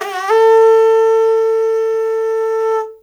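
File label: CONCH, CONCH SHELL; Spectrasonics; Heart of Africa 1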